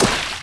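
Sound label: splash; The Elder Scrolls III - Morrowind; FOOT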